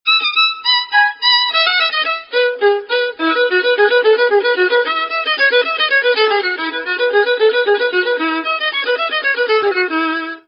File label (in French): violon, Extrait Suite Violon solo